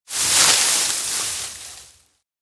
weeds bundle place; anemoi

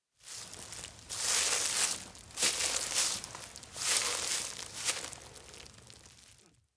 steps outdoor grassland approach fairy ring; anemoi

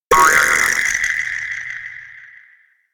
elec tent open; baiin; anemoi

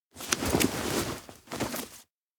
backpack book show

